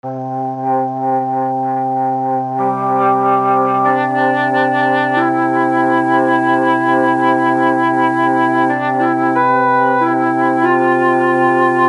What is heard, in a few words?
Akai MPC 2.10 Mellotron